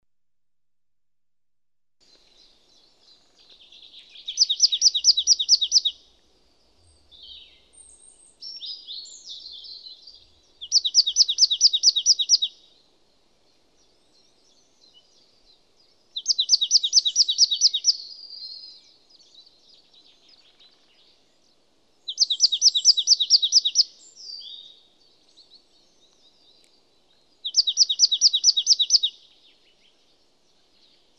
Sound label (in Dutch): Glanskop, vogelgeluiden